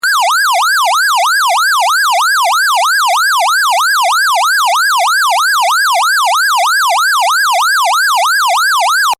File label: xcel yelp